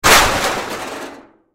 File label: Crash Metal Impact Junk Hit; Crashes; Sound Effects:Objects; Blastwave